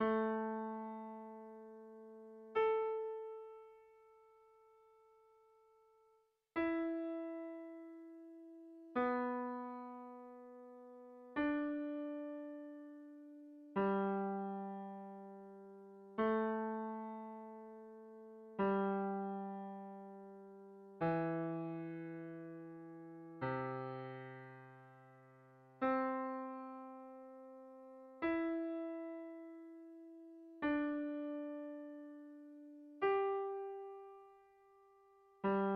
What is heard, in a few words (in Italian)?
Dettato Melodico